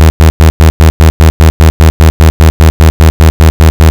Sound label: am out, AM Modulation